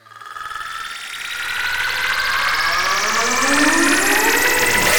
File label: bgm riser; disney melee mania ios macos gamerip 2021; spotlightdash